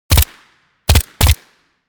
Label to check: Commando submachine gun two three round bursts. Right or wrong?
Right